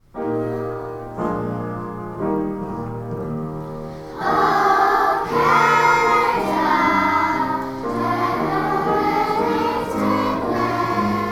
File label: O Canada Whole Choir English Version